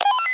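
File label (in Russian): короткий пискливый звук включения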